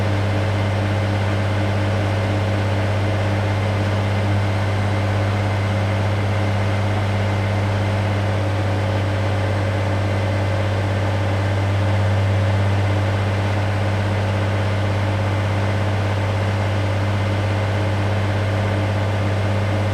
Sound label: DRIVE MOTOR; SIMUZENSOUND